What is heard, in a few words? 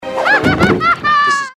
hahahahaha; hl2dm; oppressive territory; mask